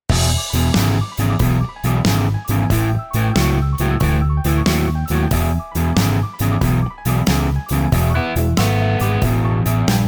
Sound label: guitar pattern